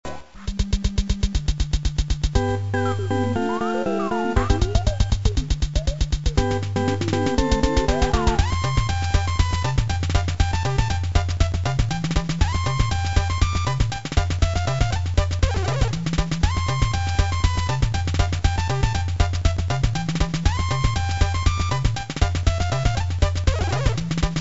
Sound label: Skater Punk Fight Music